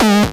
Synth Shots; Vengeance Samples

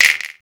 Snap; Snaps

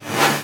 FX Scratching; vengeance essential club sounds-3

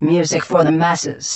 Vocal Phrases; Vengeance Samples; Vocals

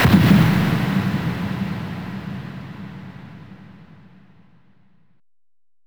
Impacts

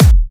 Kicks; Vengeance Samples

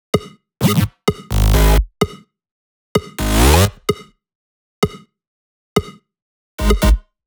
128BPM Synths Root G#; Speedy; Vengeance Samples; Speedy Kit

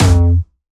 F2, Jumpstyle Kick; vengeance essential club sounds-3; VEC3 Bassdrums

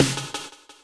tom fx; DnB; Breaks N Beats Sound Kit; DnB Kit 1

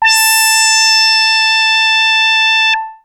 Arp A A5, ARP Odyssey synth; musicradar-synth-samples

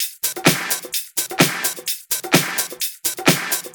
Houseloops 128BPM; Vengeance Samples

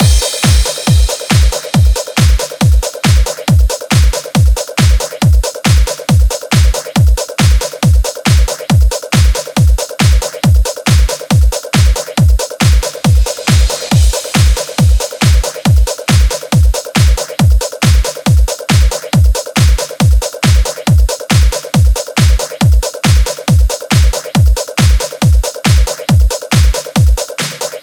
Another Day Kit 138BPM Drum Mix 16 bars; Drums & Perc; F major; Vengeance Samples

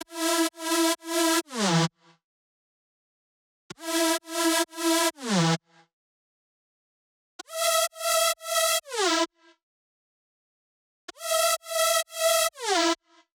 128BPM Synth Root E; Vengeance Samples; Machine Kit

